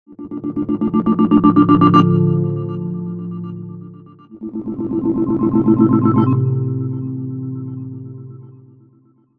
Default Stutter

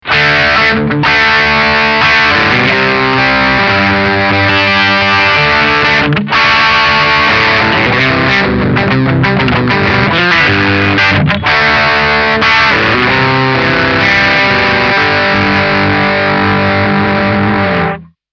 Onboard Distortion

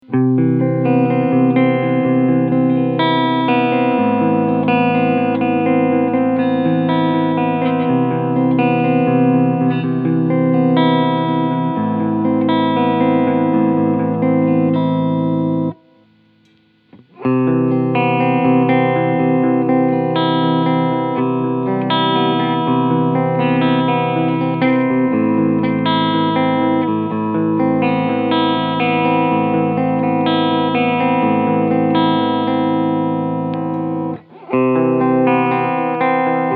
Harley Benton SC 550 Cleansounds Position 1 3

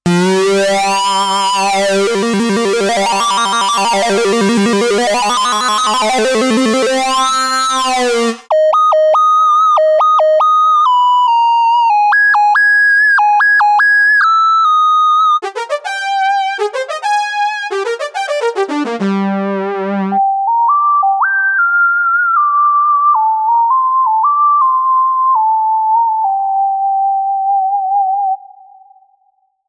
Synth Solo Roland Lucina AX-09

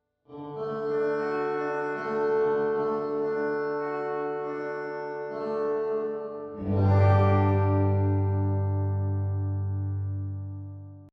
Rev-X Hall; Steinberg UR816C

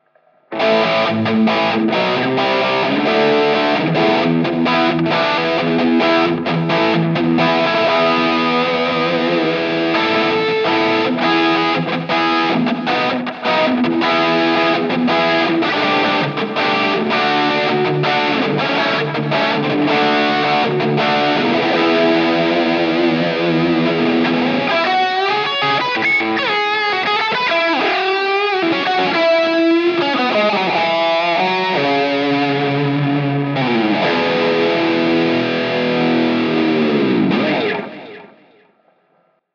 Mooer Preamp Model X Clean Board FX

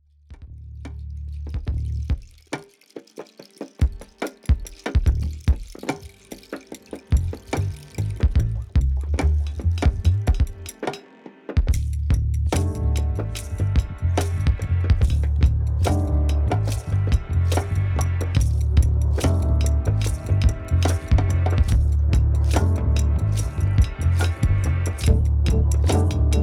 Jazz Funk Soul

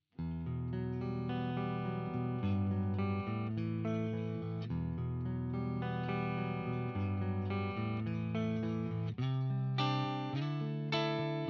clean neck

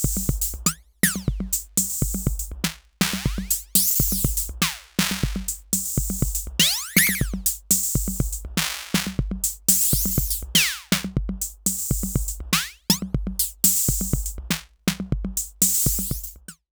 Sequence SD und HH Dec Mod HH Tune Mod

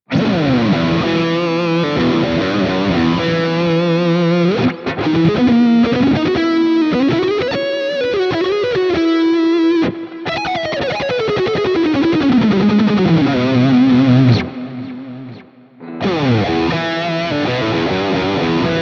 ESP LTD TE 1000 Lead

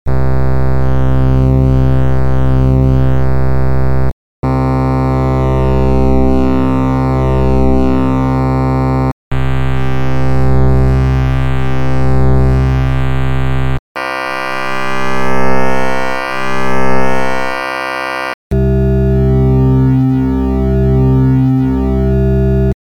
Phase Delta

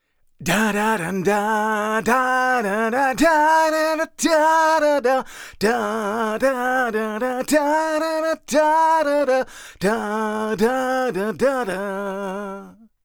Vocals Big Air Comp

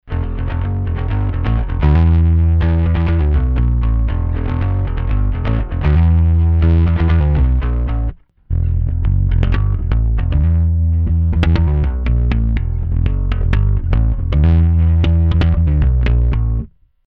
Rickenbacker Drive; GED 2112; Deep

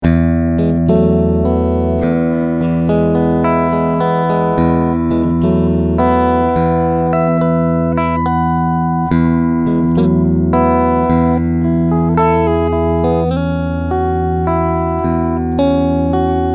Clean Pos.

